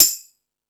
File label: Dance, Drum Machines